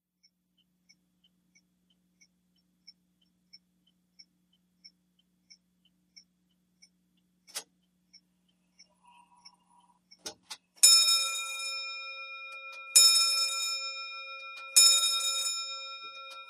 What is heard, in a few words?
CLOCKS MANTLE CLOCK: INT: 1820 s mantle clock strikes 7 0`clock and ticks